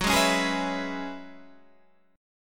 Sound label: F sharp 7th Suspended 2nd Suspended 4th, Guitar, Standard; 14,11,11,13,12,12; strummed